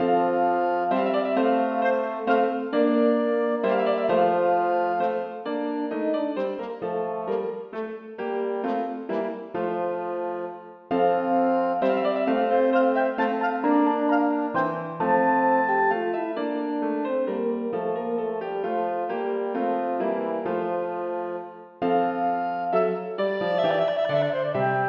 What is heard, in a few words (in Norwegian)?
Menuet for klarinett, horn og piano; Aamodt